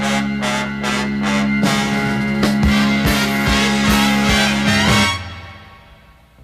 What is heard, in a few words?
horns fanfare, phonky loops